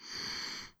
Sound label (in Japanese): ブレス ミディアム